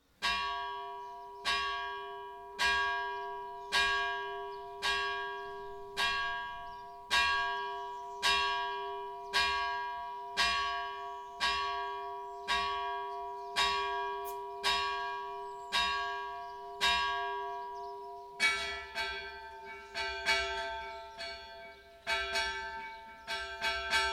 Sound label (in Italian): campane chiesa, Gonnoscodina; S.Sebastiano; S arrapiccu